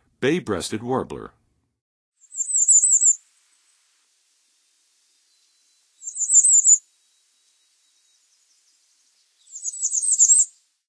Bay-breasted Warbler, Songs of Birds of North America; Western USA; Western USA Songbirds